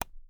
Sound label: click; Twilight